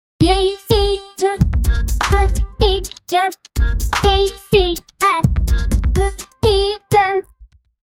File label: a childish song rap beats